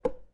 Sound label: egg hit